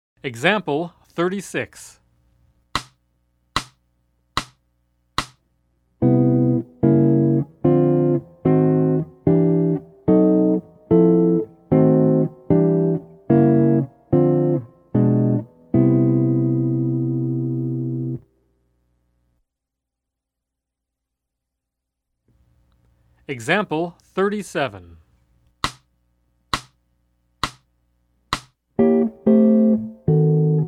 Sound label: Jazz; jue shi ji ta